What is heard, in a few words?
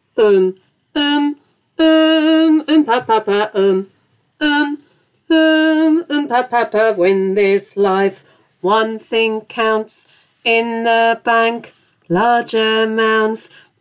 bass part intro, Choir